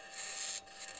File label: mic, mars2020 edlcam